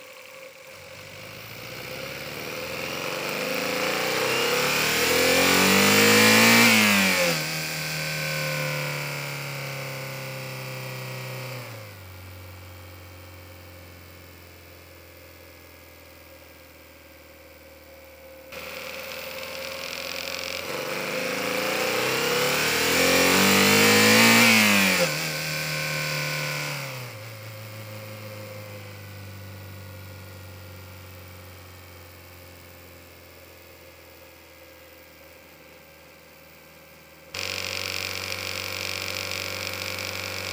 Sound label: detonation turbo mic